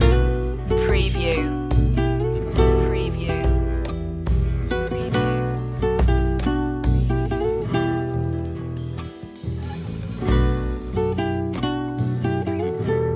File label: latino loop